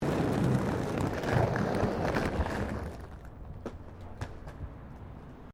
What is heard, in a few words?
ROLLERBLADING PAVING ROUGH STOP